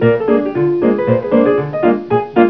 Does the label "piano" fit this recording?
Yes